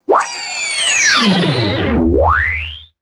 cartoon arrow whistling flight and boing impact; weapons

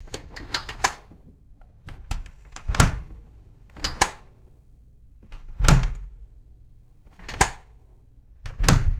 hinged window unlatching and closing; doors

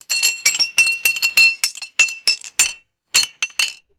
full glass bottles clinking and rattling

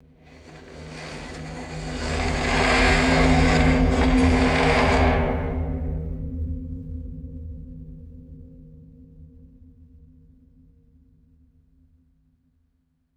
slow resonant metallic scrape and swell

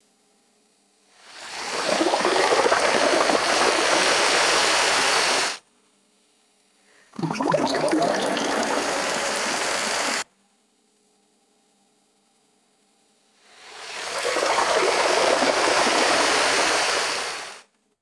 short bursts of gurgling water swooshes

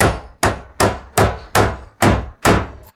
four heavy hammer strikes on a nail

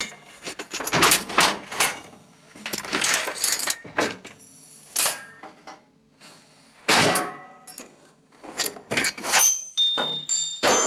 handling and shifting metal debris

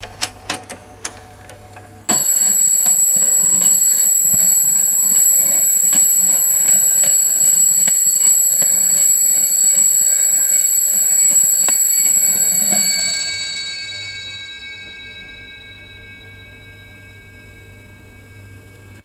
mechanical clock striking five times

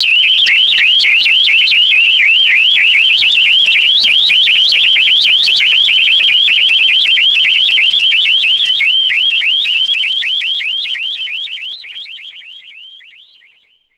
high pitched electronic burglar alarm; alarms

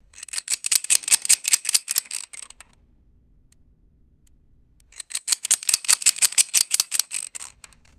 metallic revolver cylinder spinning clicks; guns

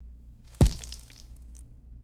heavy wet cloth dropping and splatting

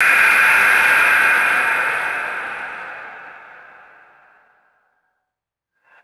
synthesized breathy noise surge and fade; designed

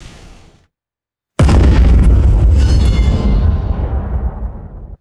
massive cinematic metallic explosive impact; designed

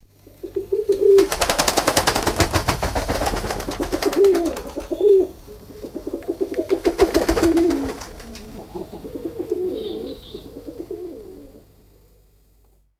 pigeon wings flapping and fluttering

